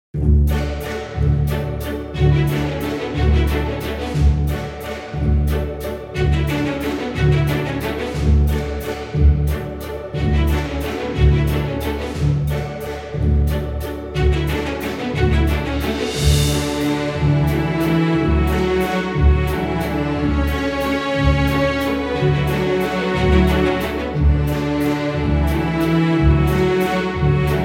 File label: Celebratory Dance Background Music